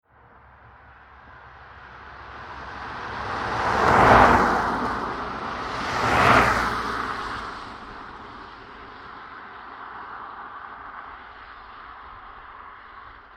Car Saloon Two Passbys From Right Fast 50MPH Wet Asphault; Transport